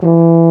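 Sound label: Brass Baritone E2; Roland - LA Composer vol. 1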